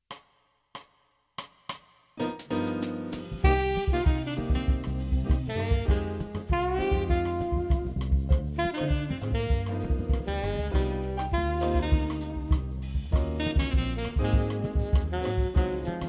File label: BLUES BOSSA